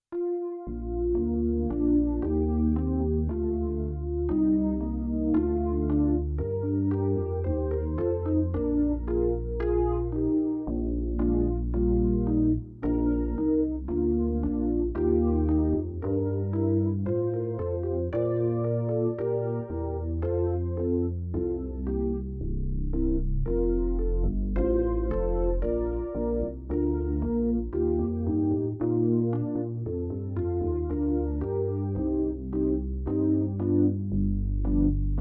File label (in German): (Präludium) Komm, Gott Schöpfer, Hl. Geist